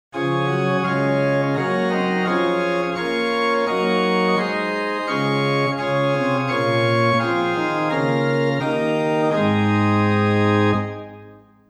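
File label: Principal 8 + Octava 4 + Quinta 3 + Octava 2, organ; Silbermann middle-sized church organ; St. Anne; Hw: Principal 8', Octava 4', Qvinta 2-2/3', Octava 2'; Reinhardtsgrimma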